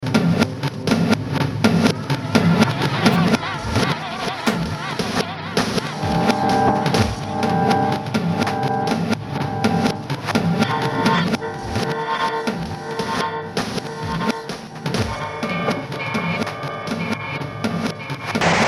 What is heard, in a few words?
jazz drum